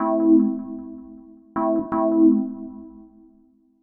DEEPHOUSE ORGAN 125 A

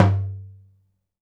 16in Tom; RadioactiveSandwich - Homunculus

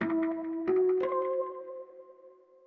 ETHNO 90 D

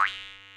JEWSHARP; ETHNICSTUFF

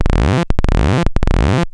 ARPEGG BASS; ELECTRIC-SET

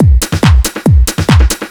hard beats 140, hard trance beats

